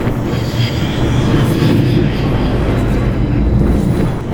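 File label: tryndamere bloodlust; LoL; demon sword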